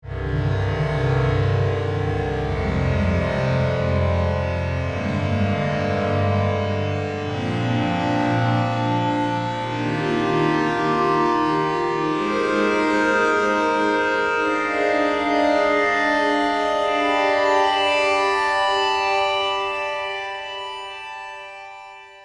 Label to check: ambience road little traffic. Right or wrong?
Wrong